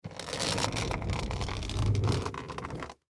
Camera Case Roll Cement, Pelican Professional Hard Shell; Plastic; Cameras; Blastwave; Technology